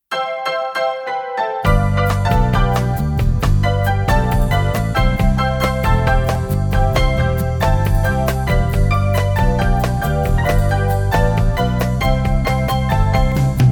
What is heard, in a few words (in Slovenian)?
KLAVIR